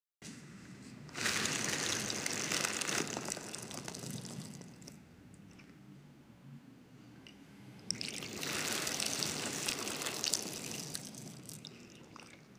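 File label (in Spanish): genera sin música solo efe, ancient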